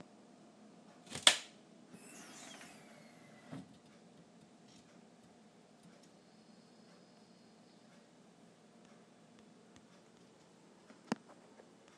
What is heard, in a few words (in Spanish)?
puerta de apertura hidraulica antigravedad de un armario de cocina